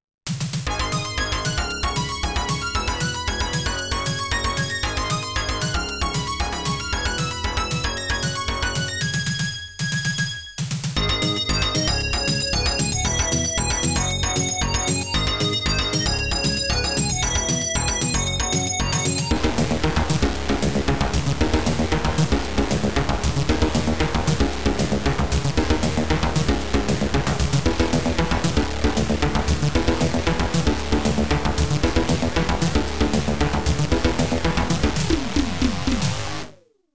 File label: OPL3